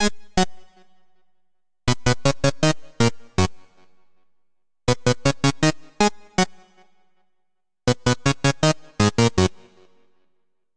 Funk Synth G# Minor 80 bpm; MIDI Clips; Melodic; Ableton; Factory Packs; Chop and Swing

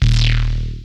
Hottbottom D#0, Analog Synths; Ableton Foundation FreePacks

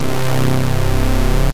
Synbass, Analog, Bass; PowerGrid; Ableton; Factory Packs; Synth Essentials

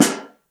SN3 Mono, Snare

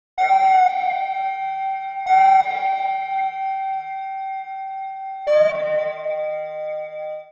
Ghost Whistle B Minor 131 bpm; MIDI Clips; Tonal; Percussion & FX; Skitter and Step; Ableton Factory Packs

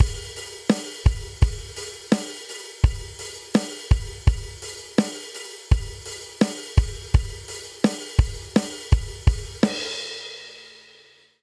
Straight Eighths Rock Outro 84 bpm, Drum; MIDI Clips; Ableton; Factory Packs; Drum Booth

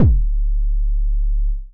Phick Kick, Bass, Percussive; Ableton; Creative Extensions

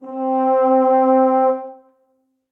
Long Vibrato, Brass Quartet; Ableton; Spitfire Audio; Individual

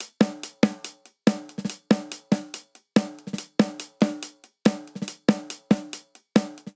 Syncopated Folk Snare & Hihat 142 bpm; MIDI Clips; Ableton; Factory Packs; Drum Booth

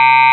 android, Digital Synths

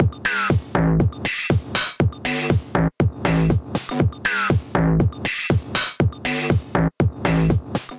Kit, Drums; High Rider Kit; Ableton; Punch and Tilt

